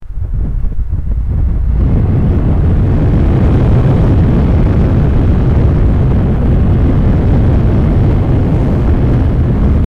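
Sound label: strong hurricane force wind, hurricane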